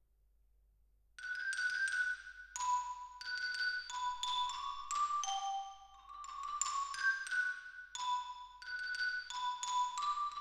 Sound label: Twin R Xylo